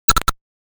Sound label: landing Safe; Frogger; Arcade